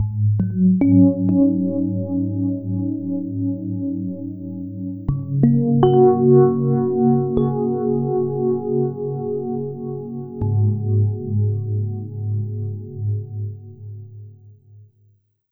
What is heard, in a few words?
FM Keys; Sonic Foundry (Sony Creative Software); Slow Silhouette